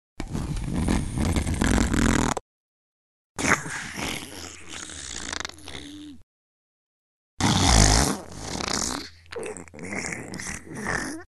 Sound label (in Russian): hrap